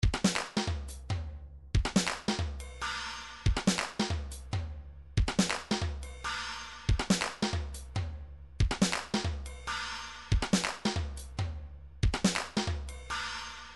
drum loop; vdrum

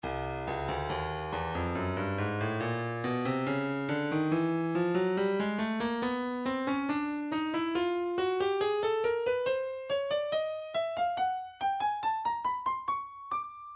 breakbeat 070 bpm; Intimate Moment B; Stylus Breakbeat Midi Files

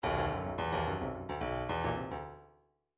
bdf midi file grooves funk r funk r

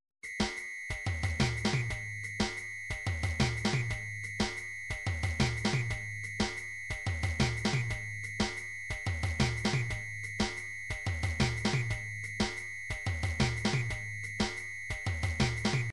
midi drum patterns drums

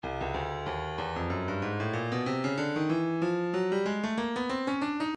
breakbeat 094 bpm; head knod d; Stylus Breakbeat Midi Files

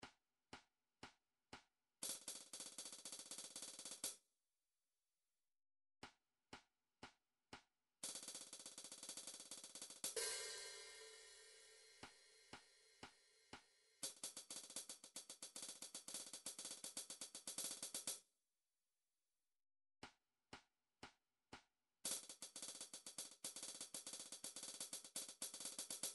roll; rumtrax library

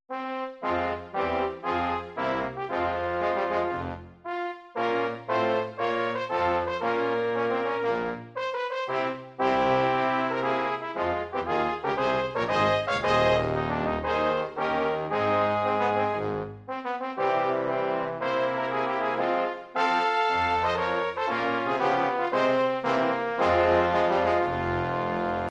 anthems turkey

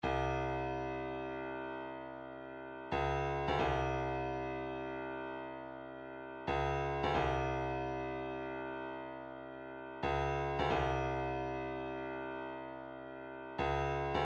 170 Fx Loop, midi

